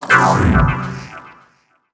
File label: Keyboard Soloist Death Underlay